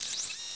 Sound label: binoc servo; machines mechanical